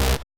Bass

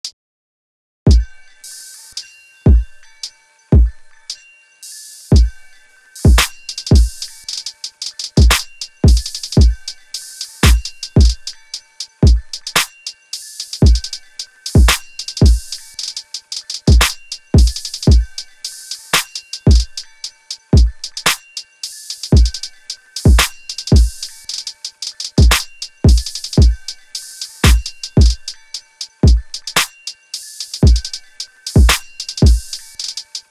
112,900bpm Drumloop

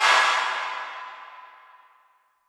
Clap

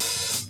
ohh, Closed Hats

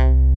808s

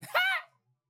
Not Nice Chants, Vox

